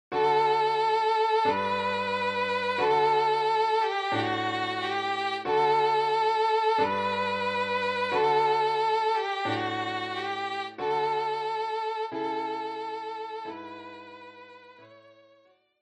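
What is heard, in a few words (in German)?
Violine; O du fröhliche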